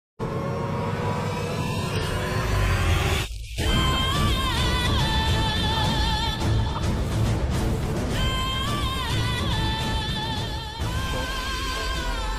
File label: villian bgm ringtone kidnapping bgm; krishnarjuna yuddham